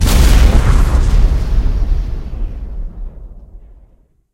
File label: explosion player